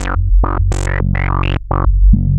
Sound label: F#1, VCF; CMP - Analogon; SH-VCF